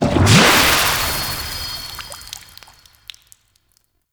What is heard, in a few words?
Maw Fishing Water Exit; Warframe; Duviri